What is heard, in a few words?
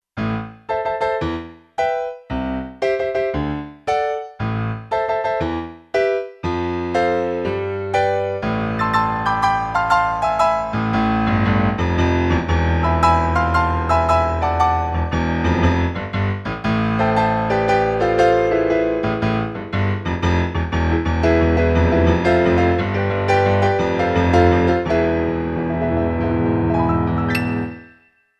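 Bright Concert Piano, Kurzweil K2500X; Factory Programs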